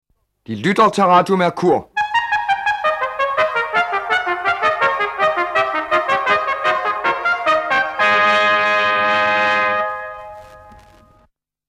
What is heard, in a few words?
jingle; Radio Mercur